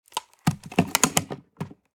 Headphones set down; headphones and speakers

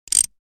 Socket wrench turning single twist

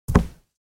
Socks single step on wooden surface

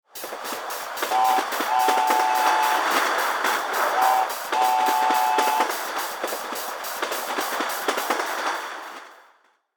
Toy train movement whistle blow; rc toys

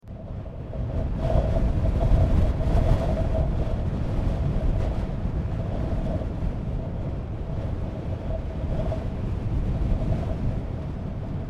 Sahara desert large gust of wind blowing

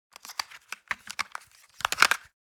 Headphone adapter handling and movement; headphones and speakers

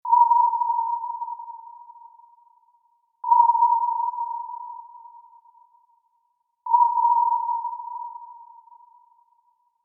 Submarine sonar pinging scan echo; vehicle

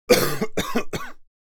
Sick man coughing

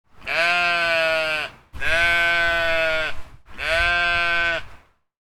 Sheep bleating

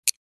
Torch flashlight turn off

